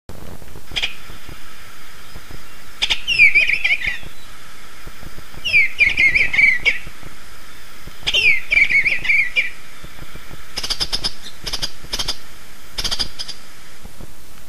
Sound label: bluebird sound